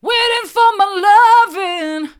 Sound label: waiting for my loving hard, vocal